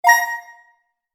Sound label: Digital Chime Cheerful Magic Button; also telephone SMS message receive; Digital Sound Effects; Lynne Music